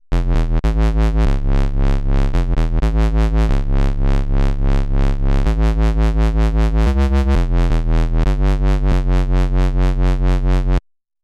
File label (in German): dub wobble fm synthese bass patch; syntakt workshop